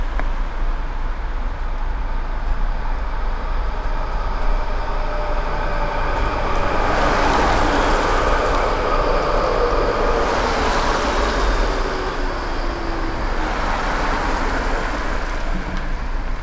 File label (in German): strassenbahn; roland r-26 testbericht